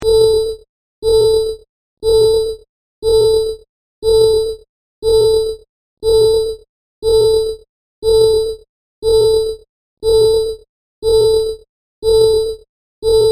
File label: Constant Danger Alarm Loop; Alarm Sound Effects